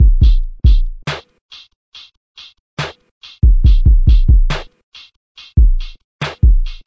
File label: 140 bpm Dark Kick Hat trap; 131 to 140 bpm